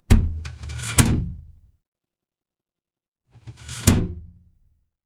mechanical lever

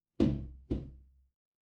impact thud

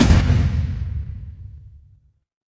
transition impact hit

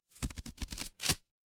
paper fabric card shuffle

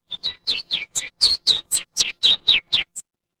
animal bird

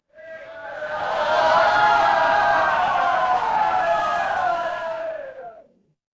crowd cheer

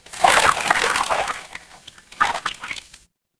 water swirl

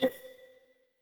ui menu

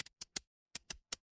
ui typing

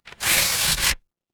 paper fabric tear

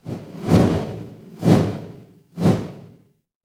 fire electric whoosh flame

